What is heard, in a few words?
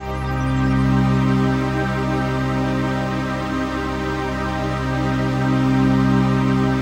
PAD, Dance Mega Synth